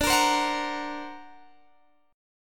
D Augmented 7th, Guitar, Drop D

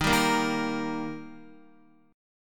Eb Major Eb down Guitar 4E,4A,5D,5G,5C,6F; strummed; JGuitar

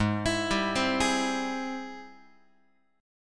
Ab Augmented Major 7th, Guitar; arpeggiated; x,4,7,5,4,7; Ab+M7; JGuitar

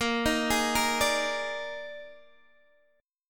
D sharp Major 7th Suspended 4th, A sharp

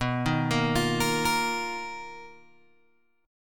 B Major Sharp 11th, Guitar, Open G

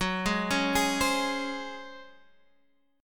C sharp Major 7th Suspended 4th F sharp

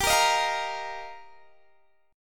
G Minor 7th Sharp 5th, Guitar, DADGAD; strummed; Gm7#5

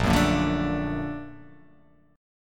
A sharp Augmented Major 7th, down

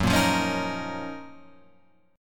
F sharp Major 13th Guitar Open F; strummed; JGuitar

